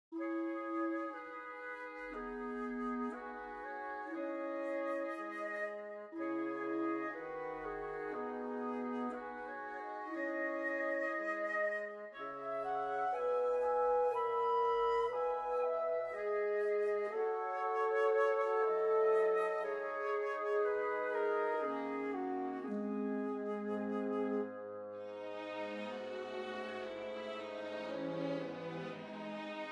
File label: Menuet in C sharp minor, orchestration; Ravel orchestration